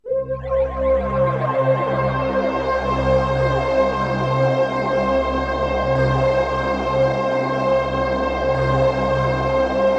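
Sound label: synth C1, Deepwater Sarrar, YAMAHA TX16W; Retro Sampler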